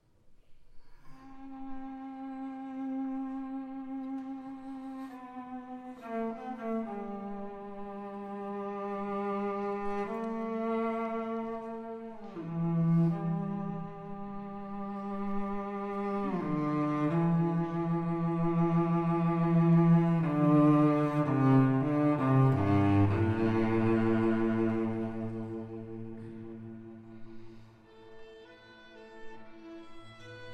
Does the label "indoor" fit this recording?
No